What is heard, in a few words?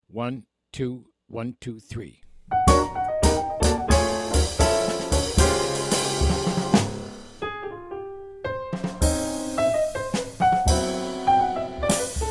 minus bass